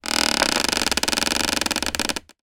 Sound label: office chair creak; zapsplat